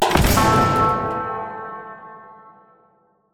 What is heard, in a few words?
Fight Button; Expeditions